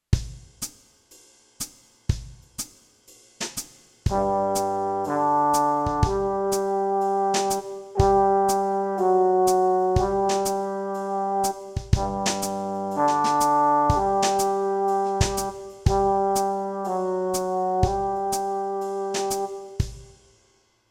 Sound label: New notes Bb, brass, practice